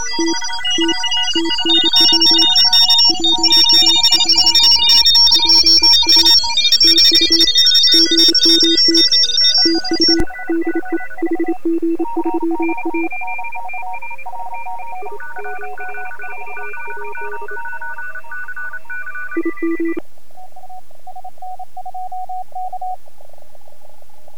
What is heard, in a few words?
mixed filter cw contest